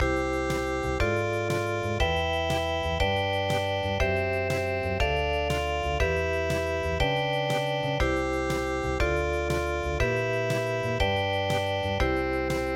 Paris Musette; Delight Dance

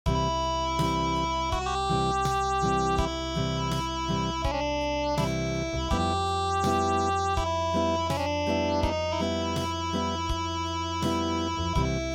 Acoustic; midi; traditional

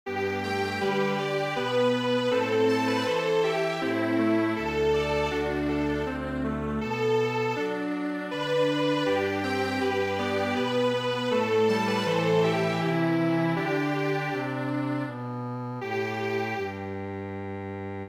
Strings Quartet

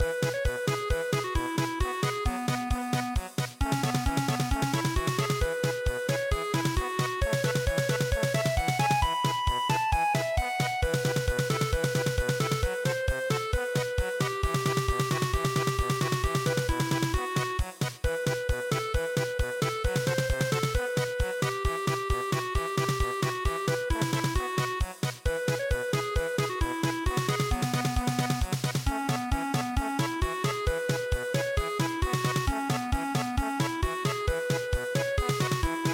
polka, midi

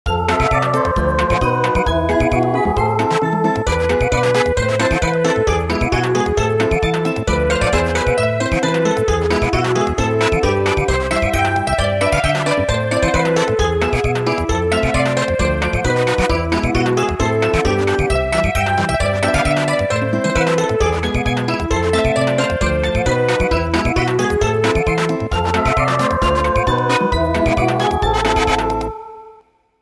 Samba; The snowstorm is blowing

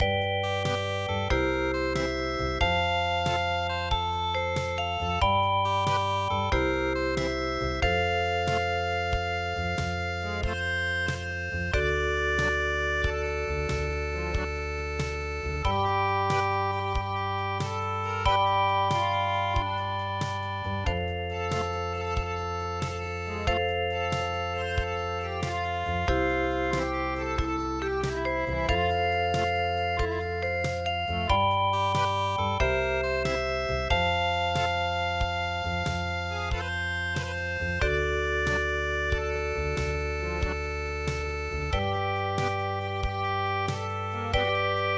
Paris Musette; MILOLI I

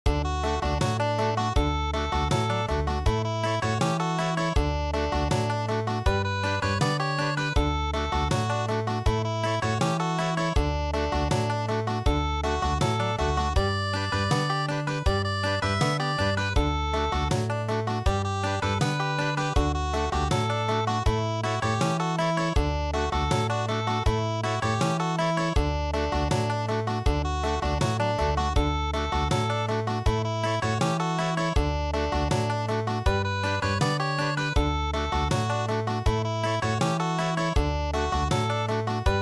Country

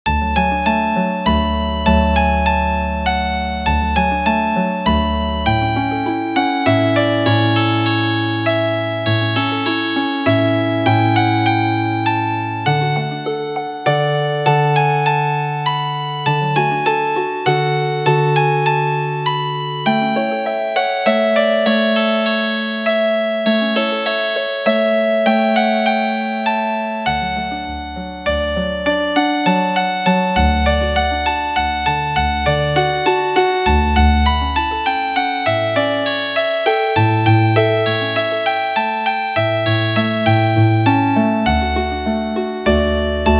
Oberek Healin Harp

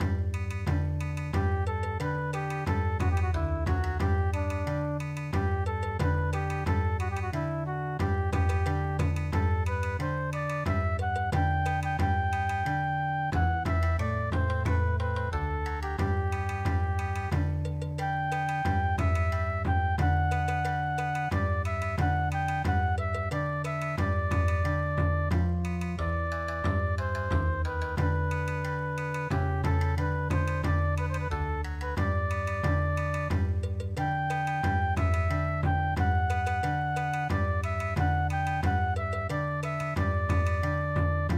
Bon Odori

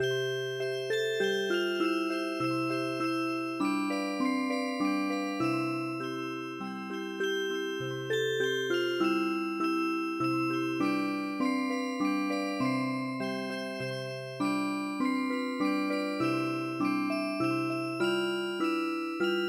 Old Carousel Music Box, traditional

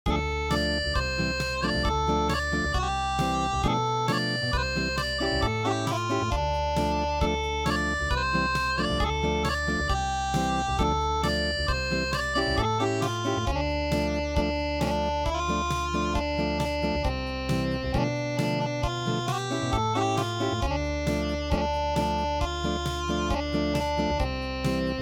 Unplugged

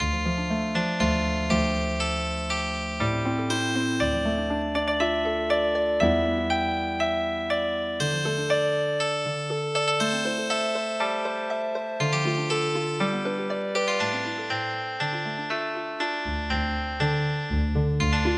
yasuki bushi Healing Harp

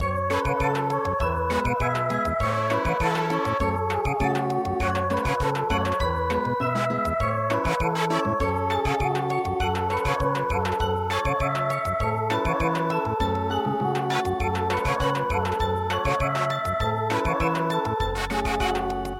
Samba, traditional

